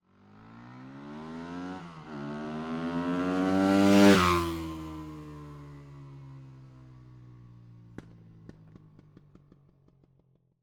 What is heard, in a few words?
Moto Beta 520 RR EXTERIOR Pass By Fast Accelerating Then Decelerating, 4-Stroke 498cc; 2010; MKH8040 MKH30; Dramatic Cat